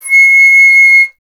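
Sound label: flute C; Miroslav Vitous; Solo Instruments VOL-2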